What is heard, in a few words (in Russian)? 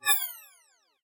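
инопланетный короткий звук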